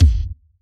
rock kit kick

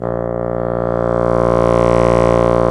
Bassoons

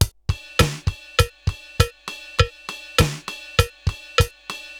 RX17 (100BPM), cyborg beats; musicradar

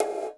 PERC GATED; Crypton; DrumBase5000

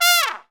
FAST FALLS; E-MU Formula 4000 Series Vol. 5; Protozoa; Proteus1 Instrmt

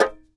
tar; Roland - LA Composer vol. 3

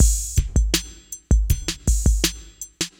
Synth Loop, BPM 80

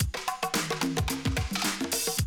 Classic DrumLoops, 110

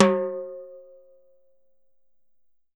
TIMBALE, Salsa Kit; E-MU Producer Series Vol. 7 – Old World Instruments

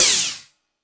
Lazer Drums, E-MU Pro-Cussion; drum machine

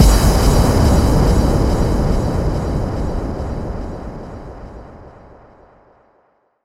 FX Kicks; Vengeance - Essential Clubsounds Vol.4; Effects

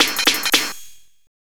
LOOP SD, 168 BPM, Drum & Bass; Ueberschall; Drum & Bass Flash Trackz

